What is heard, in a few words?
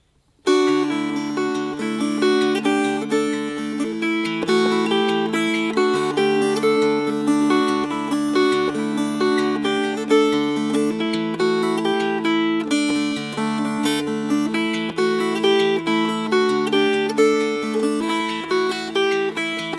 Fingerpicked